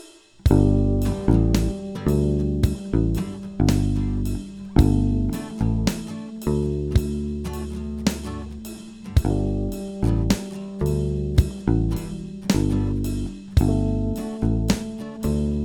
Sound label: bass drums organ guitar